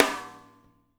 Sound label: SHUFF SN, SNARES; Spectrasonics; Burning Grooves